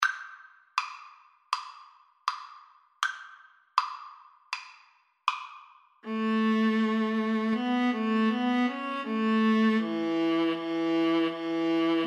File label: Viola Cello Duet